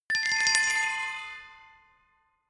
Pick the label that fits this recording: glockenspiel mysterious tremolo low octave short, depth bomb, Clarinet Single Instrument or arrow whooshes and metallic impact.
glockenspiel mysterious tremolo low octave short